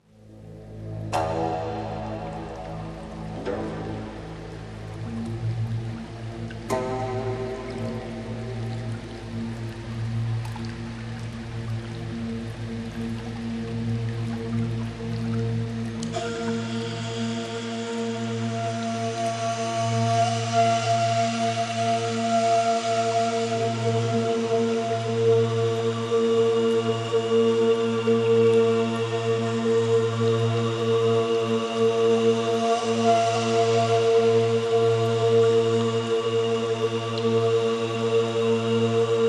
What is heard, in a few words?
Chinese Meditation